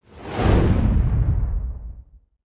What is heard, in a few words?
SFX Camera Move To Spot; Pangya; Fantasy Golf